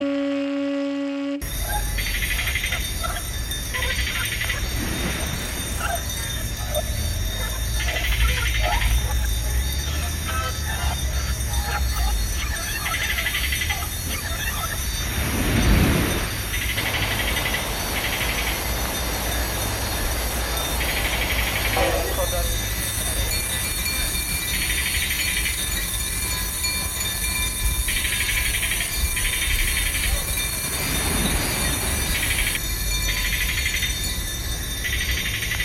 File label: Glass Love Rainbow Drums